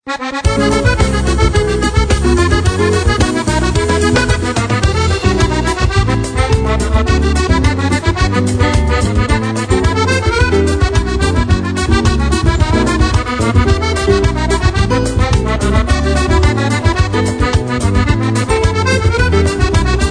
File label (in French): ambiance bresilienne